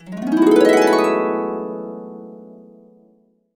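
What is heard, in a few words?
E major, Harps; Complete Harps; USB Soundscan vol.62; AKAI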